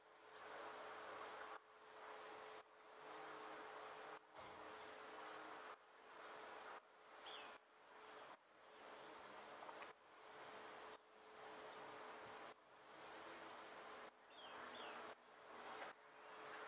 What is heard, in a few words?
Drum Loop 115 BPM Ambience; Loop Stems; Cymatics; DOPE DRUMS VOL. 1; Coffee Drum Loop